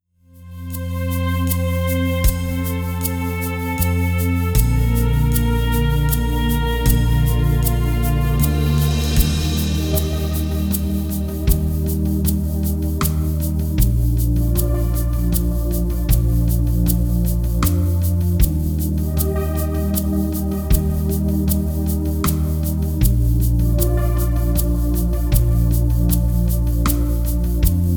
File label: rhythm